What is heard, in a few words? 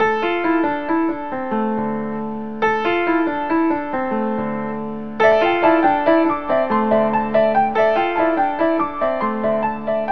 piano and flute, Sad; loops